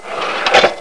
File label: cash; My Science Fair